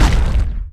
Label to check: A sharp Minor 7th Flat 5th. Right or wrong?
Wrong